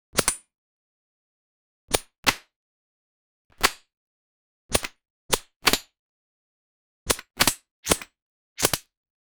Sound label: create weak malfunctionin, charge